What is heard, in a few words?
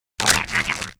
WAR ATTACK